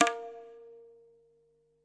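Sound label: classic hip hop tambourine; STYLE KITS (Hip Hop, Etc); Classic Hip Hop Mega Kit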